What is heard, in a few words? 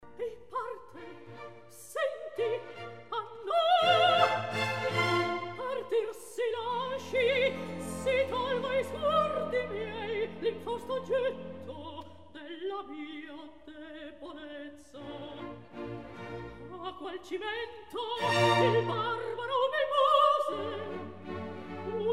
Recitativo; Ei parte senti ah no; Mozart, Wolfang Amadeus; Cosi fan tutte